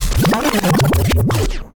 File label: bubbly spinback